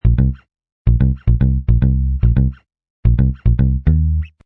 Bass Chorus Short Riff A 110, Bass riff in A# at 110bpm; Bass Loops